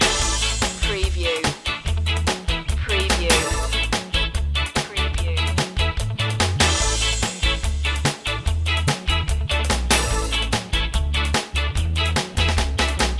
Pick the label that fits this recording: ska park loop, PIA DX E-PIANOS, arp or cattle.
ska park loop